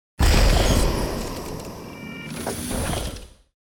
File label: Sci fi heavy ship door opening or closing